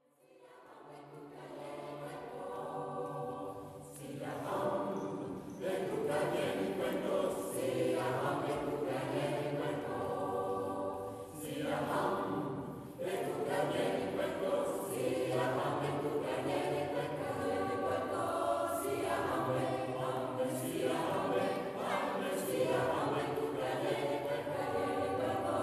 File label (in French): Siyahamba chant sud-africain, Choeur; Choeur des Mondes